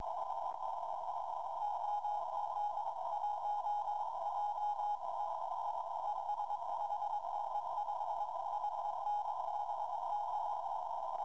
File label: 30m CW; Tukelau ZK3MW